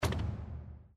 REEL STOP; Great Wall

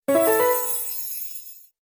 Win Jackpot; slots; Chic Town

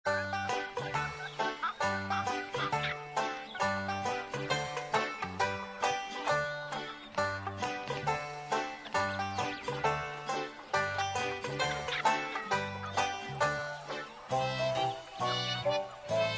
BGM MAIN; slots; Golden Barnyard

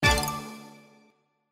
ball hit, Keno

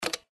Reel Spin Stop; slots; Victory Lane

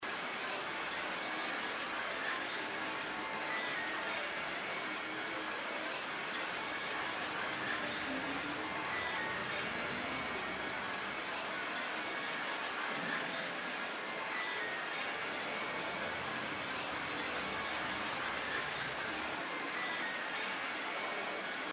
BGM Intro Bonus; Chinese New Year Deluxe

